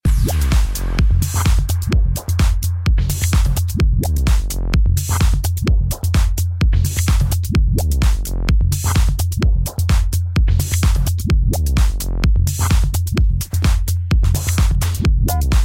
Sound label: drone; flOw